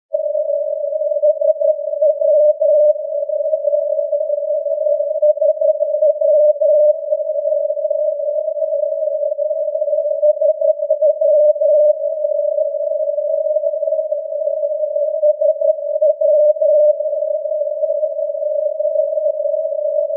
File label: in this recording of SW-309.5 you hear several times the callsign, and a long dash for direction finding; NDB Mys Khersones Lighthouse *SW